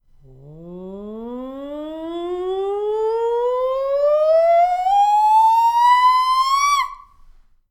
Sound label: ascending glide